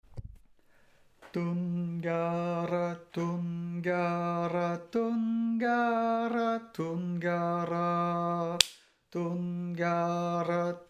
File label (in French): basse; D avec reprise E 2fois juste pour travailler